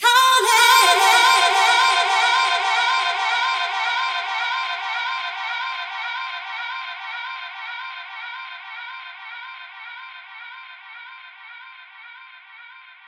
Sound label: Vox